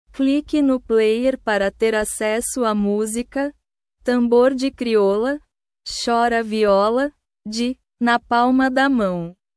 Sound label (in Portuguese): tambor de criola chora viola